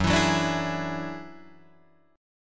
F sharp 7th Suspended 2nd Sharp 5th, Guitar, Drop D